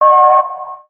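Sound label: train whistle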